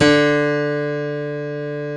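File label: piano D3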